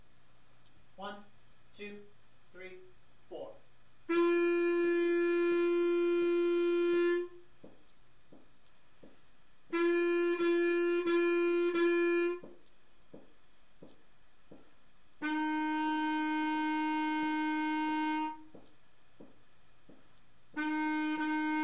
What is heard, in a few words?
clar tongues